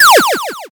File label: laser